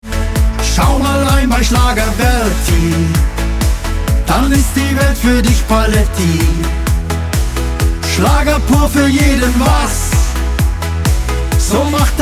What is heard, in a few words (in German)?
Opener Schlager